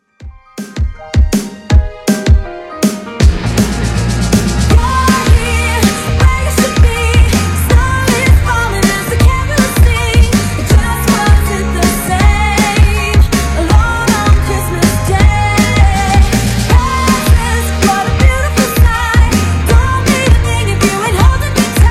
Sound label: Christmas Redrum 80bpm